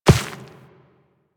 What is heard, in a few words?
Walk Dirt, Footsteps; Warframe; Operator